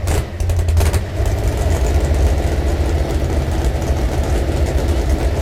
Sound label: rev short loop, vehicles junker; Source Engine; Portal 2